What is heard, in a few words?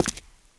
concrete; firefight